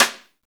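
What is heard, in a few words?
snare, Naturals; Club-50 - Foundations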